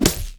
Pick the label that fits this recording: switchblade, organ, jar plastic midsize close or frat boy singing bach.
switchblade